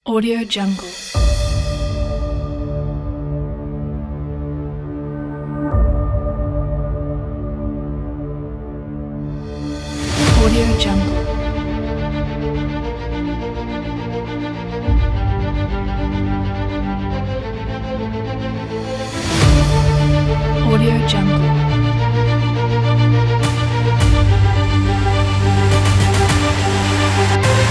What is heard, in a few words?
hollywood trailer intro